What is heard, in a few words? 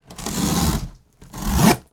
cardboard